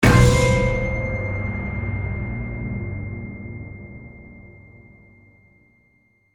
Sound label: Shock (Stinger)